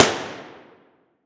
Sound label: Weapon Gun Pistol 38 357 Revolver Single Shot Interior Shooting Range; AdobeCC